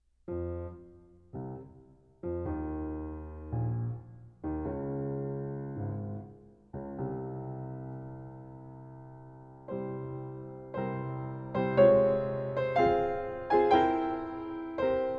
Fantasie in F minor; Op. 49

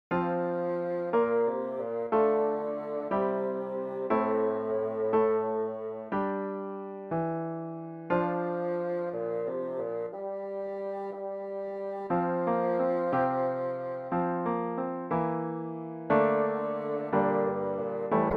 Bassoon

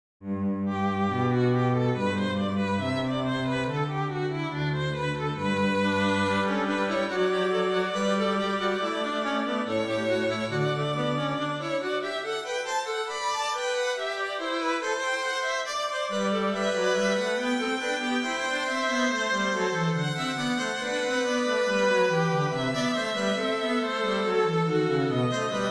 Sinfonia

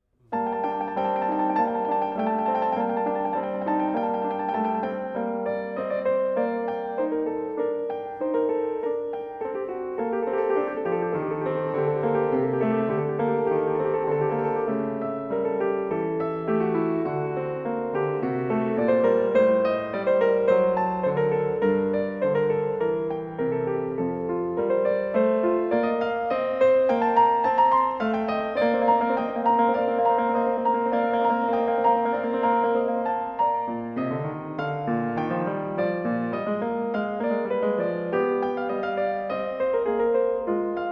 Prelude & Fugue in G minor

